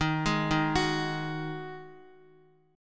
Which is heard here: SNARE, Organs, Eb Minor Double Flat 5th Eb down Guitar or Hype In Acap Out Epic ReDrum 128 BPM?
Eb Minor Double Flat 5th Eb down Guitar